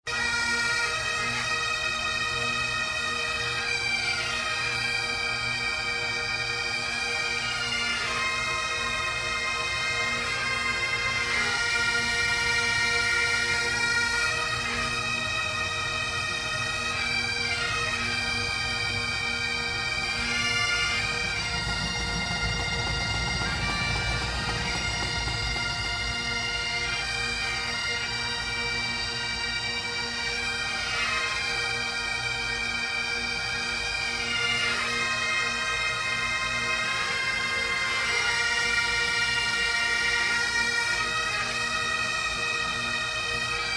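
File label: police memorial bagpipes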